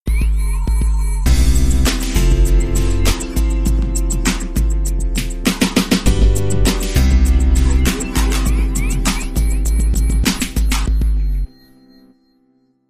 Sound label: Up Piano Main